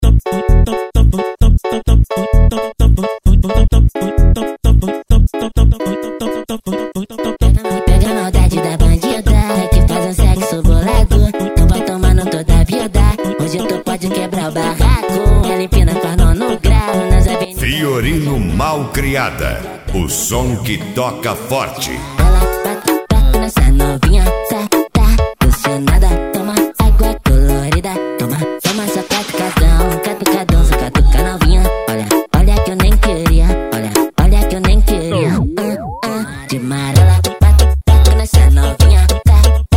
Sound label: mega funk bass